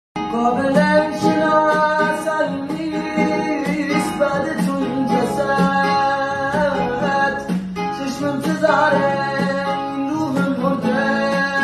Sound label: Version Guitar